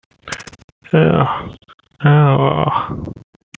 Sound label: Human sounds Human voice Groan, vocal imitation